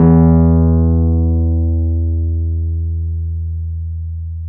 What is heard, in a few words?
PNO, Wurly